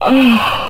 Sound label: "oh" orgasm; ooh ahh